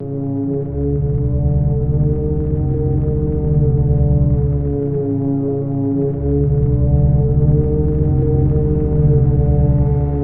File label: Hoovering on Venus D2; Zero-G - Ghost In The Machine